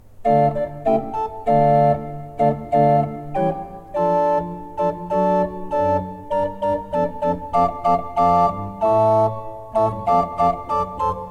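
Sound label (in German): Tzschoeckel 5 I, pfeifenorgeln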